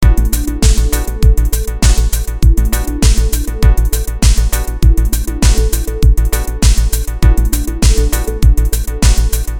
ambient

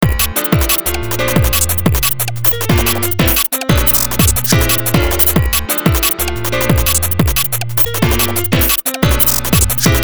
breakbeats test

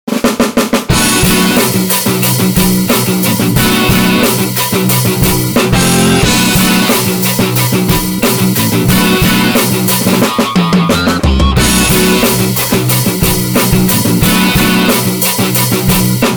JCM900 rock test

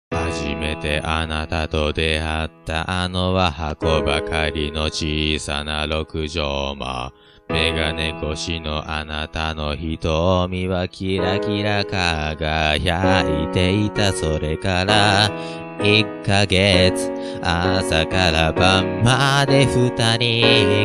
Rainbow Girl for Piano

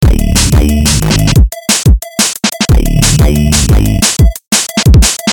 dnb test

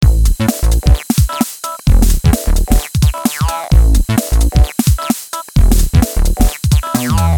2step test

